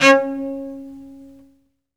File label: SOLO CE, Strings; ILIO